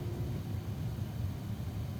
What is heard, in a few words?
Lab Heater